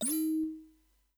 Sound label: shot; chenjesu